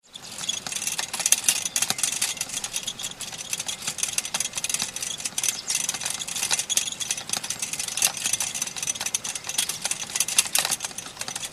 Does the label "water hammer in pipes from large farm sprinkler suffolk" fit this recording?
Yes